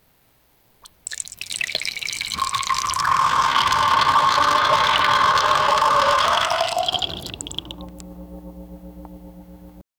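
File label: battery draining until dead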